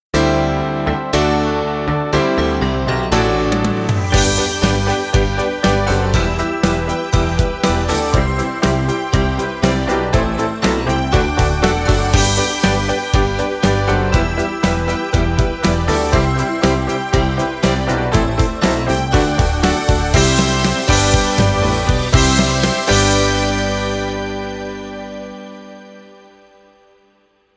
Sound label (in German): Werbemusik Playback